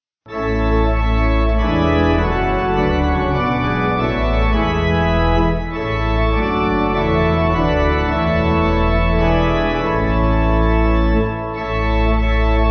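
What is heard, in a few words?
Pipe Organ, Music Backing; Fy Nghalon Cred; Sarah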